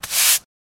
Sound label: Sweep Broom On Cemen; brooms & mops